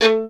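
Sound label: STR violin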